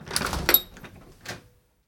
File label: door open; Golden Crayon Sounds